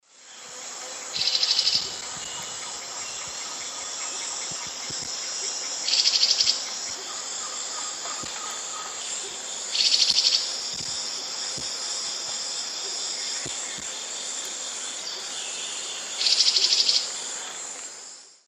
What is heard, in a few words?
Euphonia rufiventris, calls; S. America; Eastern Lowlands